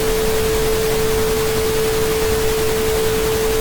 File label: Sine And Noise